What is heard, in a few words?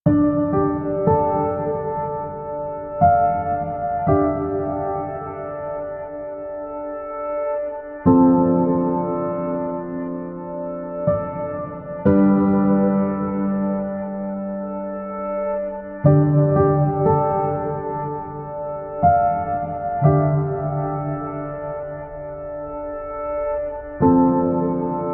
Piano and Pad Mix